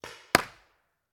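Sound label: Paintball Gun Impact; Sound Ideas; Guns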